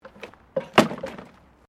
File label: JUNK, Debris